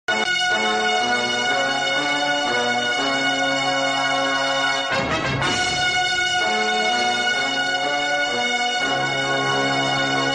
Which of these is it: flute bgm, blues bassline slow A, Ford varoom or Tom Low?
flute bgm